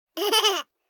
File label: BABIES LAUGH Boy 12 months Short; Baby Boom